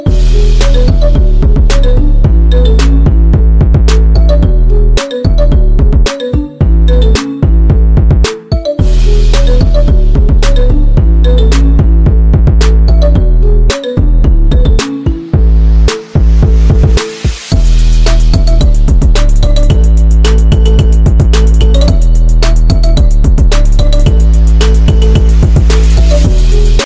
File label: Nokia phone remix ringtone